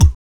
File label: VOC PERC, Vocal Planet Jazz FX BASS PERC; Spectrasonics Vocal Planet